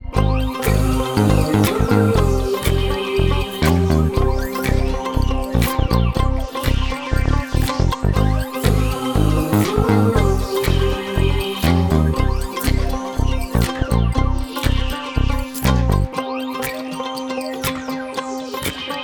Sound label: chorus1 64 beats